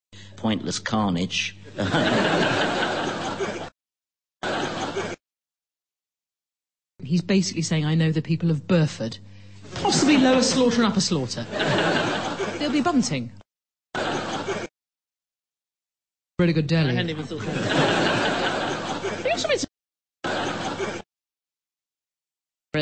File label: audience